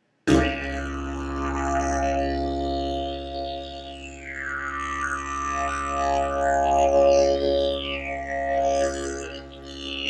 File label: didge